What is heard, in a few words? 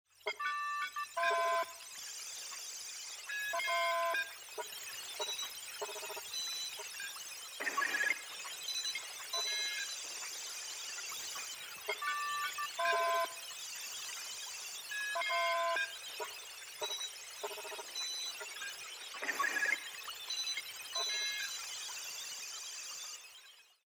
cybernetic